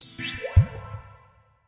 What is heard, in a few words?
D&B Synth Riff; neuro